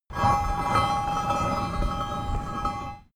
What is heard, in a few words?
massive metal scrape